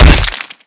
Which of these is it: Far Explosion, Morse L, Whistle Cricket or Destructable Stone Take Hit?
Destructable Stone Take Hit